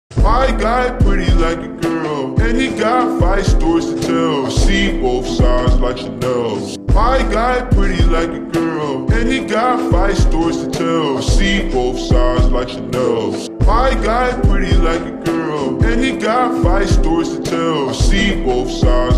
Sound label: instrumental type beat